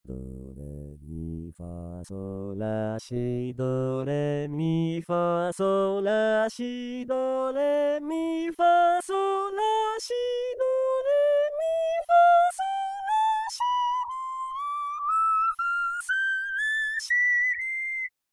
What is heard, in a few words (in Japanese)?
doremi